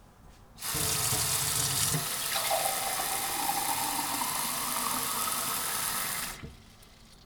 Tap water

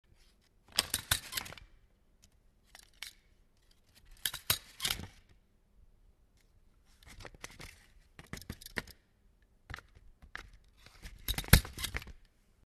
Stapler

